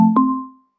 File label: complete done, progress; huashu design; sfx